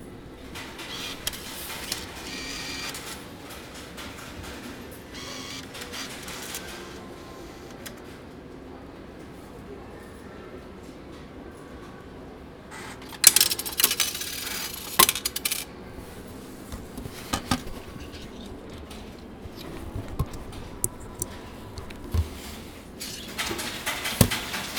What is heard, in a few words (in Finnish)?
kolikkokone